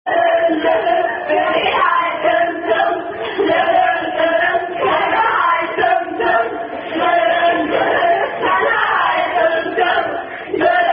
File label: German Attack Song; Jeaaa Mann Bfc-Ladys